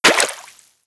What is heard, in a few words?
debris water, bullet impact debris